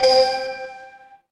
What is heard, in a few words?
ui chime